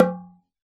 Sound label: oxaca drum, percussion; ILIO